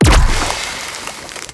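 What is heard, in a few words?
milk cannon, impact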